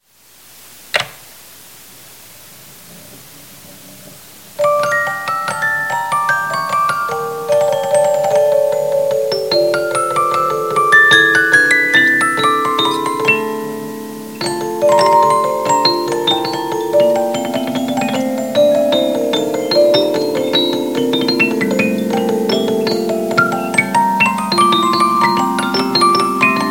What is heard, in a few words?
menuet, music box